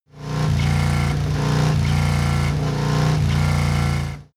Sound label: motorcycle harley engine rev triple